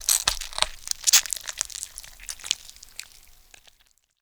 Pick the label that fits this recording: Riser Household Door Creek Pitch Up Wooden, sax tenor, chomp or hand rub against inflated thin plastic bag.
chomp